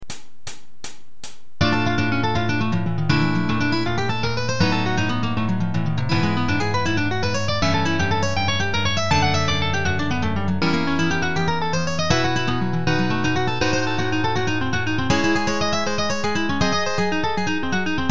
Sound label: advanced sweep concepts arpeggio over chords, guitar